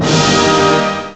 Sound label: ORCH, STRINGS; STRINGS3